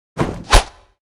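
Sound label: monster rabbit ugly attack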